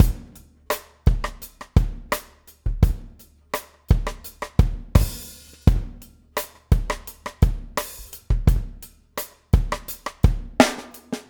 left stick; 85-LEFTY

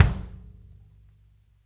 kick, Interactive Drum Kits; Sonic Reality

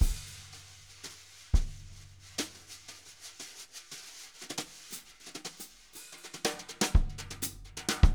jazz brush loops

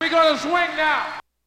VOCAL BITES; Kid Nepro; Elite

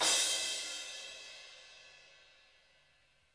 crash

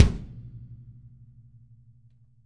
kick

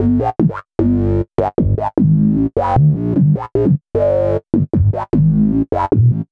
Synth Bass

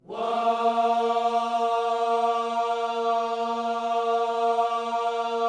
"Whoa" A#3, Gospel; Spectrasonics; Vocal Planet

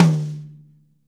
mid tom; 90s sample CD

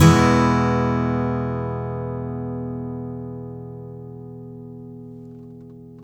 MAJ E UP, Blues Guitar; ILIO; Fretworks

